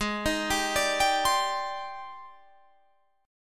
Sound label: G sharp Major Sharp 11th, chord